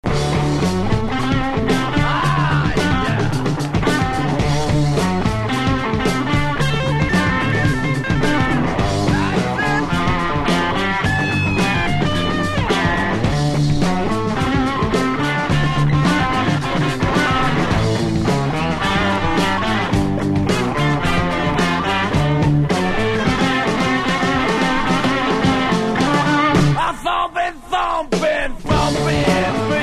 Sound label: thumping beat